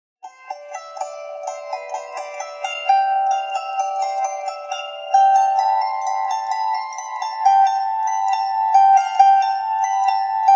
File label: Lap Harp Tune